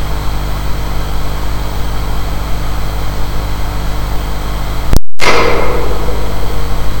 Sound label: beam On Ted; lhc collimation